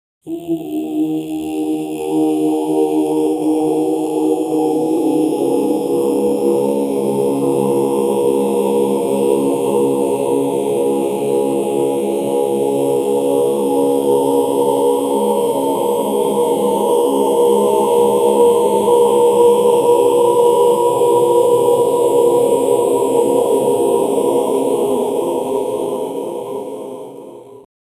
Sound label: a chorus of cultists, chant